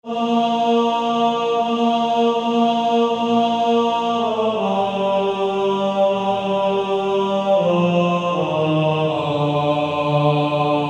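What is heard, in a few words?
Male Choir, tenor 1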